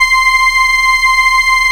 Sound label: SIEL, String Machines